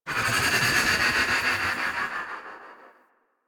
Fairy Move Thrust; DE; Warframe

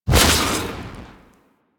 Flame Thrower Fire 3D A; Sargas Ruk Flame Thrower

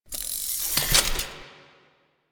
Sticky Bomb Reload Ready; Grineer; Veilbreaker; Vor

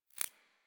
Briefcase Akimbo Pistol Aim Exit; Warframe; Weapons; Corpus

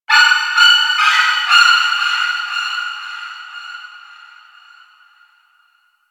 Forest Distant Bird Of Prey; Ambience; Warframe; Grineer Forest

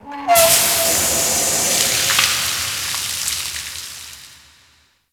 Ocean Steam Blast Small; Ambience; Warframe; Grineer Ocean

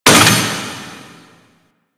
Vaults Stake Gate; Warframe; Entrati

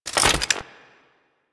Spear Gun Reload, Weapons; Warframe; Corpus

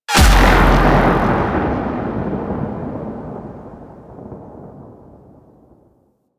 Beam Fire; Mission One; Condrix; Warframe; The New War

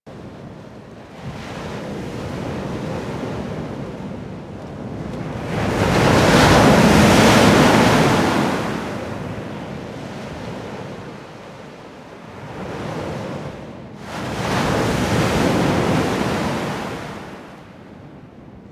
Distant Ocean Loop; Ambience; Props; Loops; Warframe; Eidolon Plains

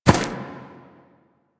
Ram Step Walk Grass; Animals; DE; Warframe; Quests; Duviri

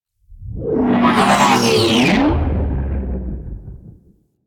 Sentient Ship Close Fly By; Warframe; Enemy Ships; Space Fighter Sentient Coil Agent

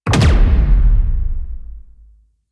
Archgun Charged Punch; Warframe; Archwing

